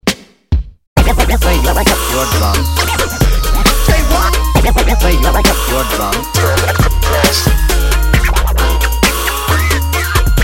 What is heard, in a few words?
Scratch Intro Outro 67Bpm